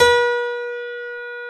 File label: b4-d#5, piano, grand; Giga Samples Collection; ARS Grand